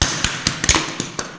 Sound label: grenade; Mafie 1